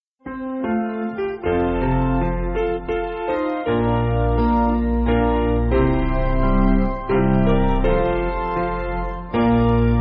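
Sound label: Piano, Backing Files for Churches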